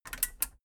metal button radio click power on slow

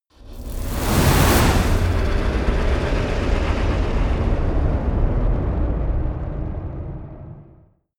rattling hit

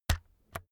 keyboard external key hit slow

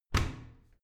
washing machine modern close

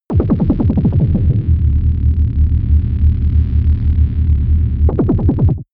space alien engineroom

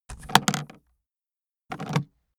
aluminium case open

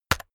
keyboard external key hit very fast

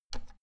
oven button press

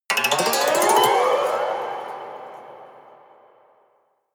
haunted strings weird stroke

